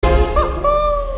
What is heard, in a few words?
robo